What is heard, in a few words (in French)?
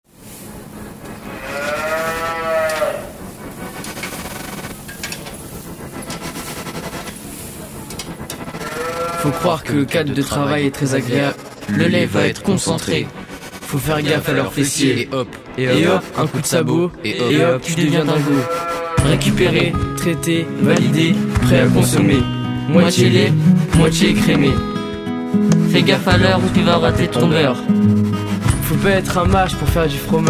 Musique